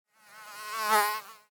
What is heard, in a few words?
Mosquito Fly By, Animal, Mosquito, Fly, By, Wings, Insect, Bug; Mosquitoe; BlastWave